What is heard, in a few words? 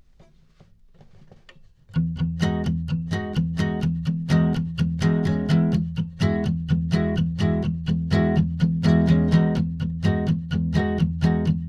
Guitar